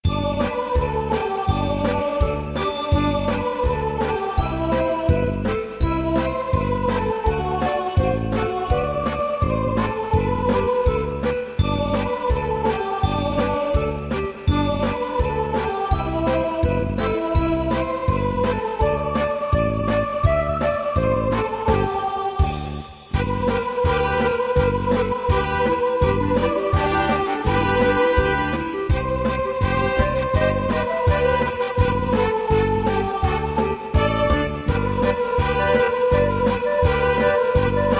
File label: jinglebells